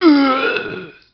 zombie pain, male

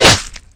sword slash; ragemap2024; grunt

